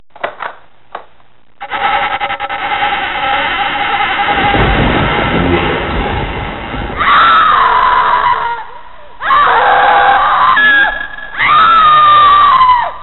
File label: entrance, spooky sounds